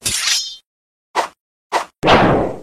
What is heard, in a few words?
Sword; Roblox; gaming